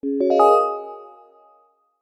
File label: level complete